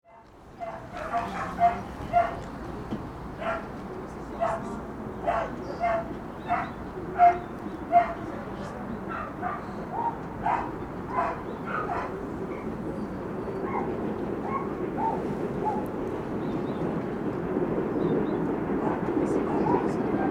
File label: chelas